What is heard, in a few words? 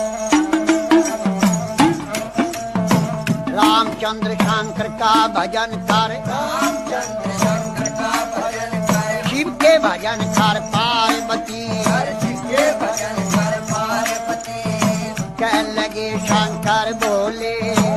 Bhajan Ringtone